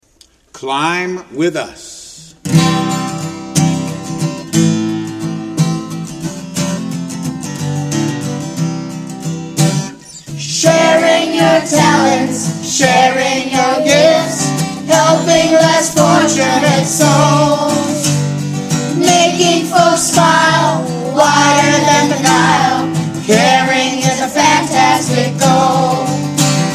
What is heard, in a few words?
School Song; Climb With Us; Tariffville; TVille